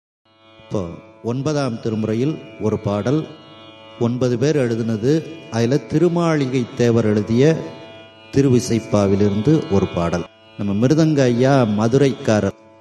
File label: thirumurai isai; olivalar vilakke ulappila onre